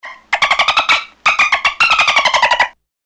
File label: sound dolphin